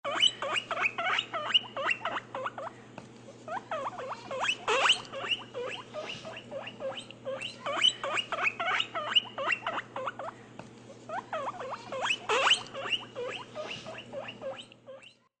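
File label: Guinea pig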